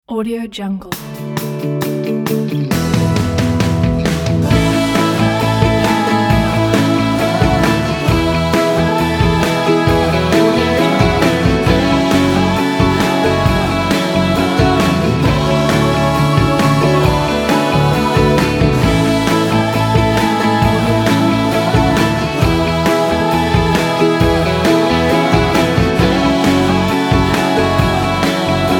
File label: uplifting motivational acoustic